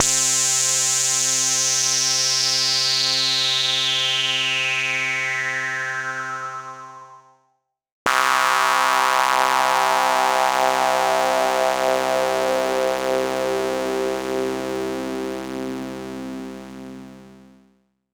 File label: Yamaha CS40M Pads